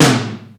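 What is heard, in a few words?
processed tom, drums; Crypton - DrumBase5000; PROC.DRUMS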